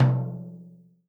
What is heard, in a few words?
Ludwig 14 Tom; RED ZEPPELIN